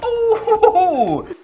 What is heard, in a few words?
"ooh hoo hoo hoo" male; ooh ahh